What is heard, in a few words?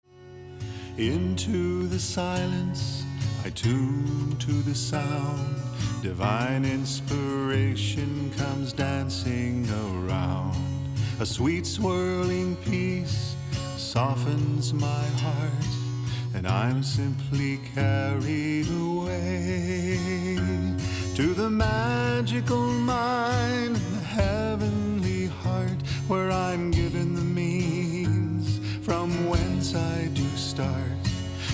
Tuning